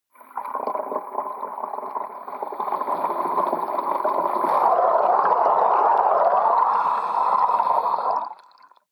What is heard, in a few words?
sink underwater fill